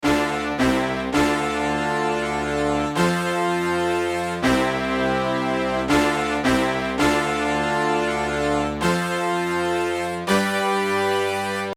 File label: lush horns